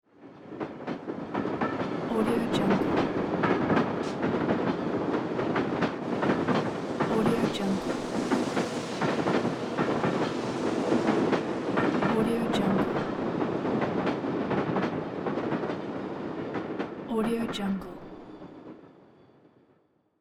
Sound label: train